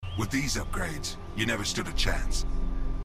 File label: upgrades